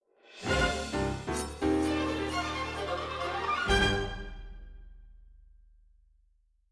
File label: Field Battle Outro; the legend of zelda tears of the kingdom